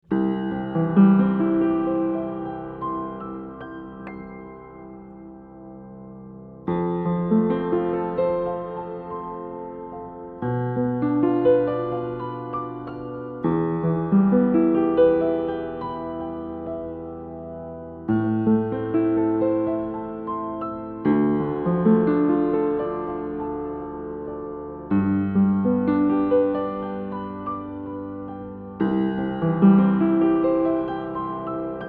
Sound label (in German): DPA 4060 Piano PZM Innen Deckel